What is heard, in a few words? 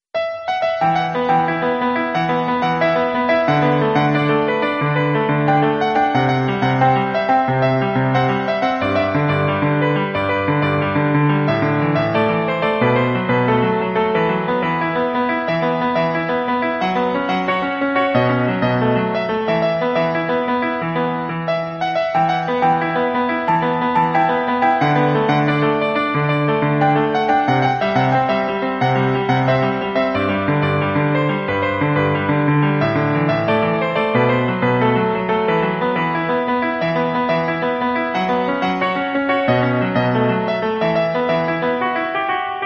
sad love story piano duplex